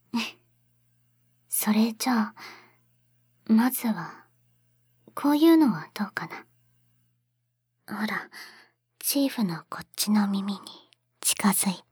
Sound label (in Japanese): 耳舐め, ASMR; 蘭の耳舐め; アイドル; Happy☆Prism 木流 蘭